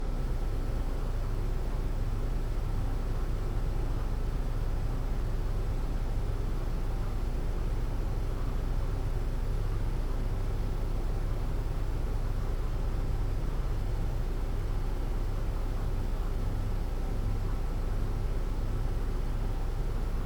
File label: ambiance snowlab quiet